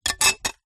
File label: foley glass thin small sheet pane down on surface